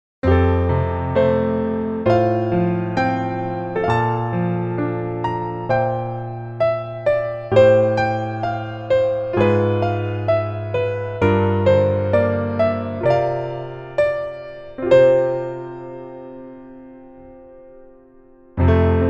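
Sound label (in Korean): Piano version 멜로디 MR 남자키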